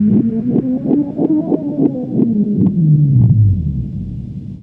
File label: Scratch Tape; Ambient Grooves